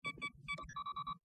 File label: sensor, computer